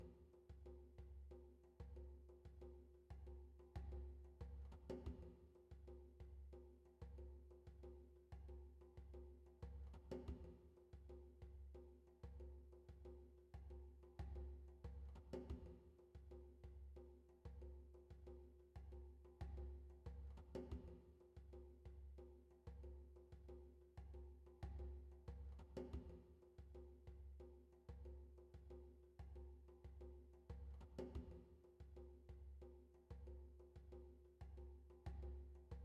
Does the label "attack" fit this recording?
No